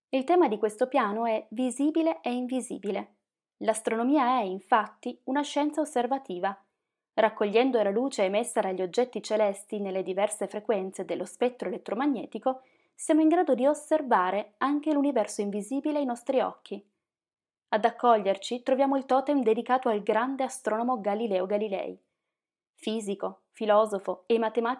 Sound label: Piano